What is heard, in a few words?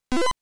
Pick up Item